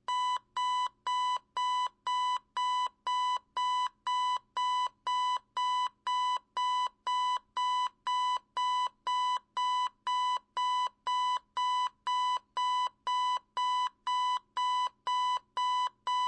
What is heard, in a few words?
Household, Alarm Clock, Buzzer Run And Turn Off